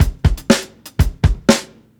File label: 120 SPARSE, DRUMS LOOPS; BASEMENT DRUMS VOL 2